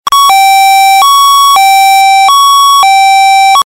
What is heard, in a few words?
Hi-lo; code 3 mastercom